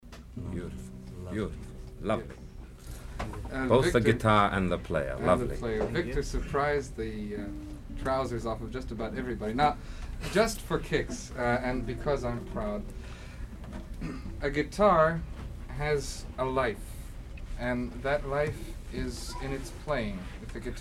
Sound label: Guitar Intro; Skip Talk 8 - Guitar Lives